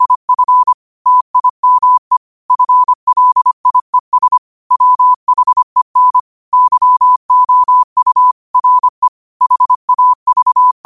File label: 5 wpm, morse